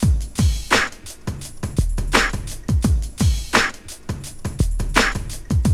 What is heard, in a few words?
85 slow; AMG; Now CD-ROM (Roland); BLACKtoBLACK